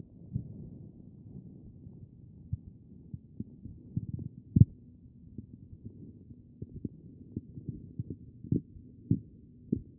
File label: A CHEST; B MODE